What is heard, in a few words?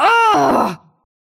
Short Scream; Lewd SFX